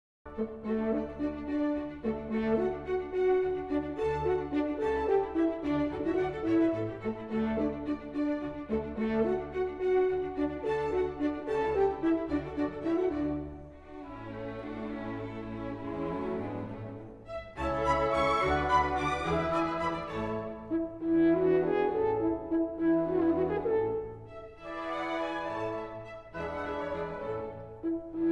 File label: Horn Concerto; Mozart